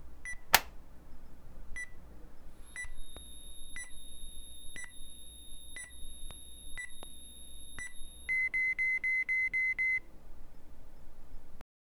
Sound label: an electronic device powering up with a sequence of beeps